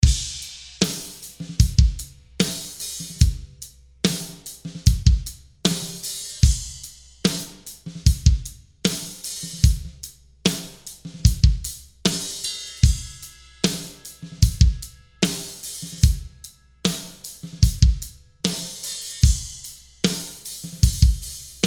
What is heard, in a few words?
verse stomp close hats swell fill